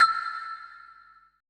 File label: HI BLOCK, PERC; BPC PERC